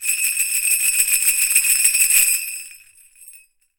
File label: SUZU, JAPAN PERC; AKAI S6000 CD-ROM; WorldSounds